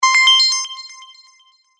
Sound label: Multimedia Chime, Technology, Multimedia, Chime; BlastWave